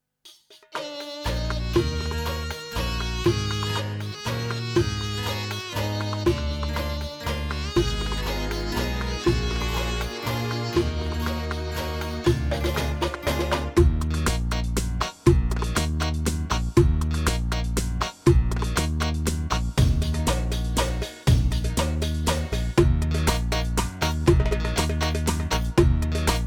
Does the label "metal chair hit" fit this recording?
No